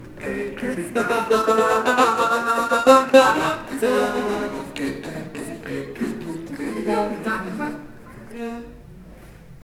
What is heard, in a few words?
man farting multiple time; audience reaction